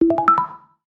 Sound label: Messenger Incoming; GPSMAP H1